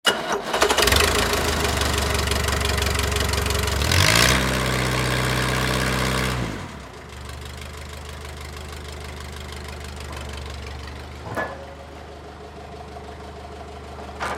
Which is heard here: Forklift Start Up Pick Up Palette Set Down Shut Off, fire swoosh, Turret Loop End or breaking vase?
Forklift Start Up Pick Up Palette Set Down Shut Off